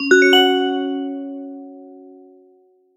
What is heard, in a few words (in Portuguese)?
notificacao